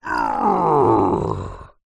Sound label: fantasy giant angry growl